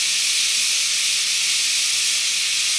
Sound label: steam loop; Star Wars Knights Of The Old Republic I; XBOX